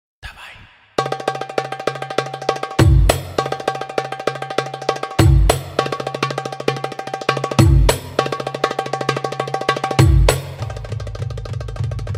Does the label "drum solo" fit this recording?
Yes